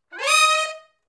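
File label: Hi Rip Up (Eb) uni, horns; Sections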